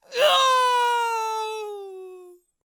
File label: Devout Gauntlet Death Vocals